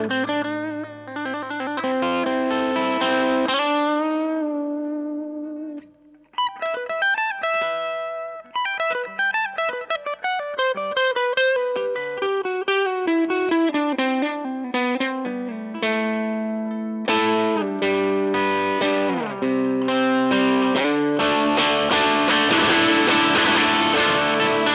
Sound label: DC Junior Fat Crunch; Harley Benton